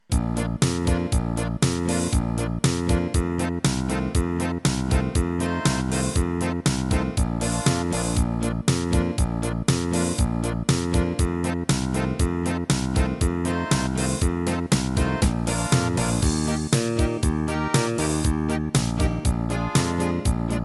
Polka

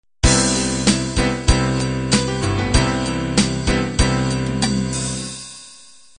rock ballad intro

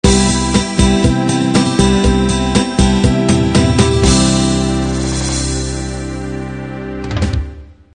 standard 8 beat end

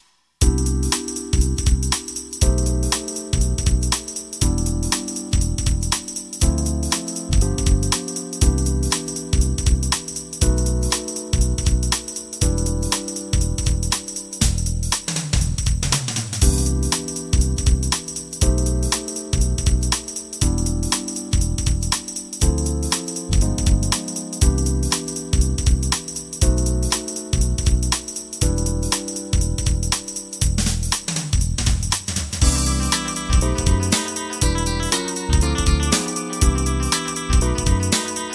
Groove Funk

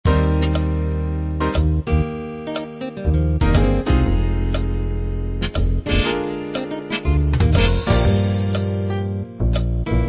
cool jazz

